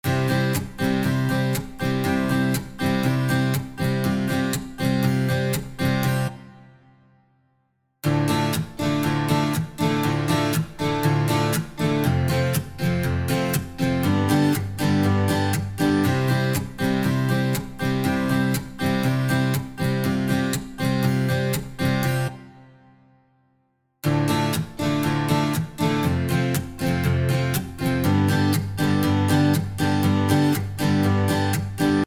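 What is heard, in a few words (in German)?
Ein Rhythmus aus India; Eventide Tverb; Native Instruments India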